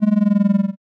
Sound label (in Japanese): wanawana